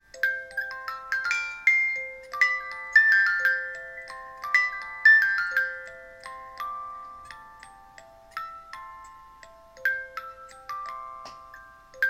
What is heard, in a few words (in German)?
Spieluhr